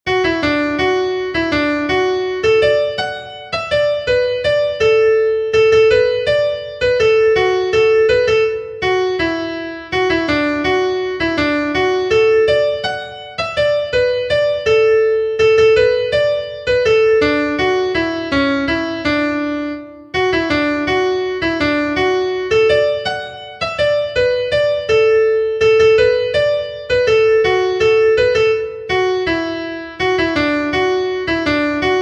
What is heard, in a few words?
WALTZ, fiddlers; HALLES